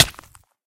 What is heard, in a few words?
hurt flesh, damage; ayuncraft-1.5